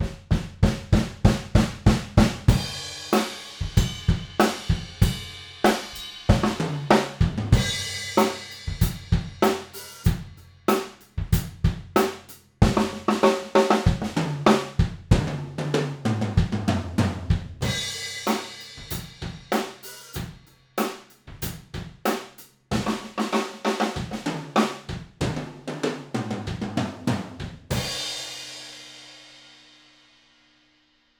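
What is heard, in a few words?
Room Stereo Cardioid, drum; drum shootout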